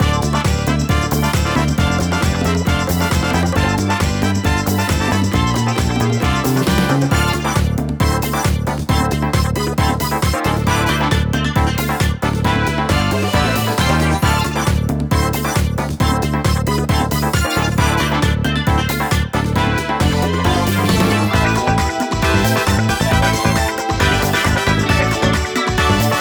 dance; Vanquish; Gorgie

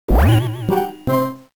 BOMB Mario Step ON END; WarioWare Inc

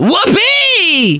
miners; Candyadv

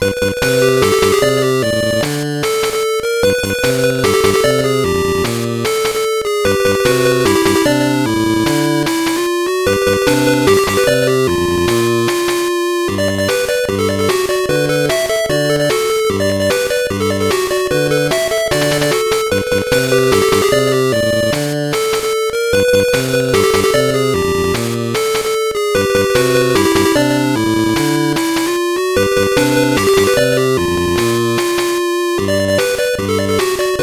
Unused Song; Mogura de Pon

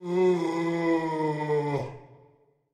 Voice Hurt; BatIM; Searchers

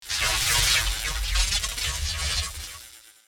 Charging; MK9; Raiden

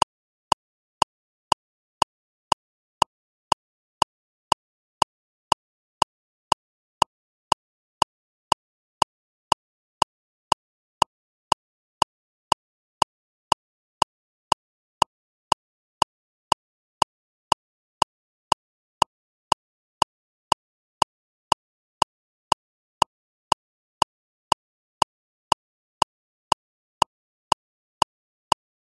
CLICK TEST

